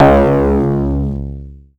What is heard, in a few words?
C#, Synclav FM PLUCKED; Big Fish Audio